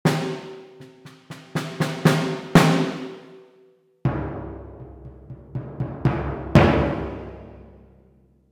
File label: Synchron Percussion; Spitfire Audio Abbey Road Orchestra High Percussion Vergleich VSL